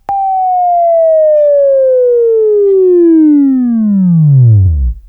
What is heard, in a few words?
Buzz, Sweeping Training